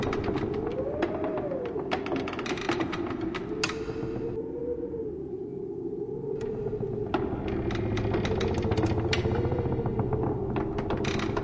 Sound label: house; Cosmic Rage; Farming